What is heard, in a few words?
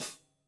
hat closed